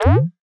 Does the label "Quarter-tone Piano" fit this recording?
No